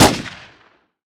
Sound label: lr300 shot